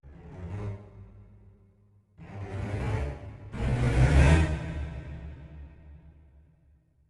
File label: Horror; The Emerald Tablet windows gamerip 2019